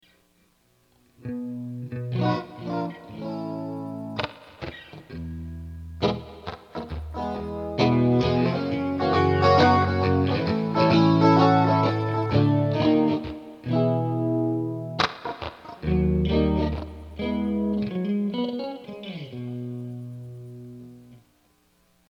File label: rock